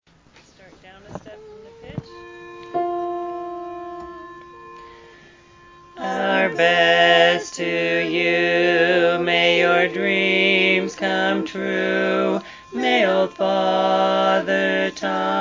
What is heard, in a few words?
our best bass